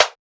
snare slice; one shots; SEISMIC Drum Sample Pack; Slate

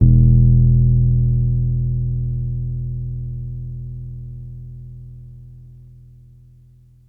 Bass one shot Sub Long E, Electric Bass; Slate Digital BASSHOLE Sample Pack

